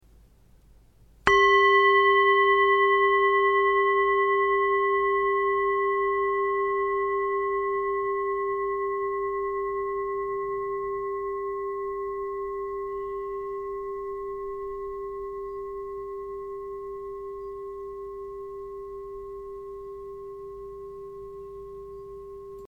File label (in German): Klangschale